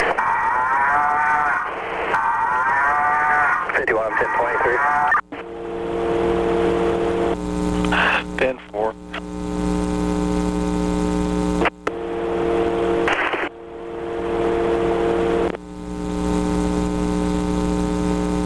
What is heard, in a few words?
moo moo; Hoss looking for some COWS